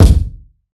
loud kick c key, bass drum sound tuned to the C note. Loudest frequency: 374Hz